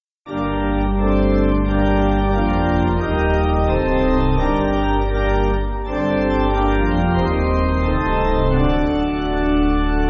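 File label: Pipe Organ, church backing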